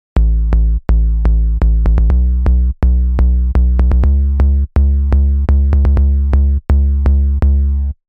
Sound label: Synth Bass